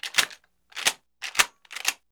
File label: Shotgun Pumps; Weapons & Explosions; Sony Pictures Sound Effects Series